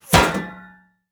metal bullet impact; Rust

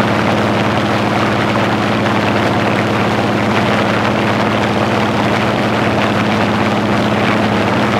engine ai plane rpm 99 mid; War Thunder; engines

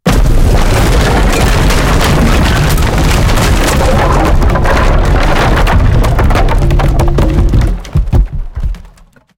wood building fall big; tanks; War Thunder

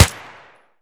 Machine Gun Fire 5 56 End; Weapons Automatic; DCS

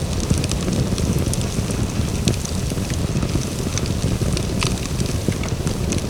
fire wood trash small

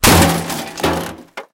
streetlight crush; tanks; War Thunder

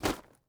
ui drop tarp; Rust

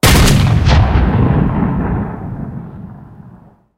cannon 90mm m3 shot, tanks; War Thunder

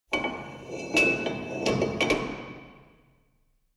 hangar layer; War Thunder

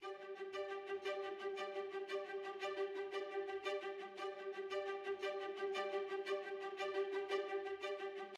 music interactive; World of Tanks

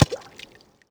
water small

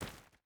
footstep barefoot stones run; Rust

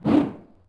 Swoosh Weapon; Civ4 warlords

